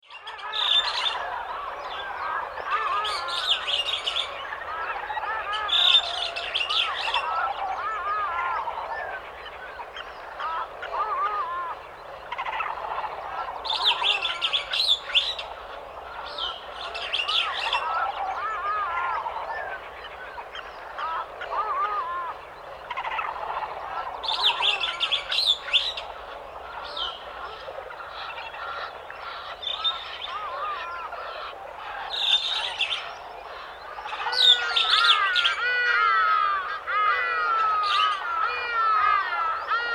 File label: Jungle Morning